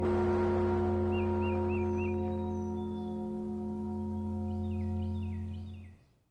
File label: bells; Covid19DK